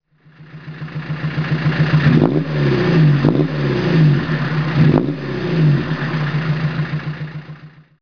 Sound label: vw borla; Diesel